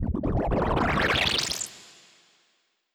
regrowth

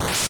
sword slash lowbit

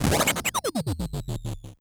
waza hit lowbit slow

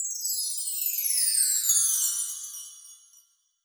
bar chimes down reverb

